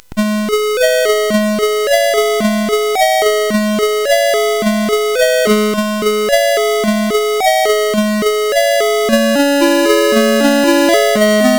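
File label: Nautilus, MOS 8580 SID chip music, games; CSG8580R5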